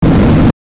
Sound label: Strange Noise